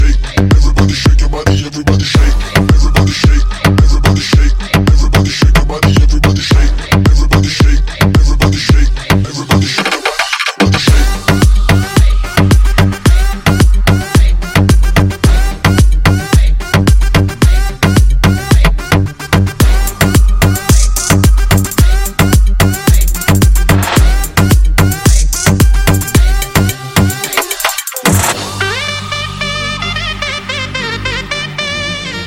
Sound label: Shenai Tik Tok Ringtones; Bollywood